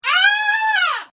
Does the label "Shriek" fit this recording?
Yes